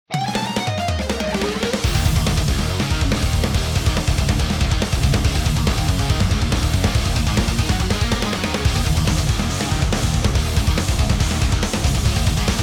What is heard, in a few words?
backing track; G.O.D. COMPETITION素材